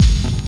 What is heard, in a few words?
BD, Dark Side Of TripHop